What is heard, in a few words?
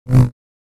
Saber; FXHome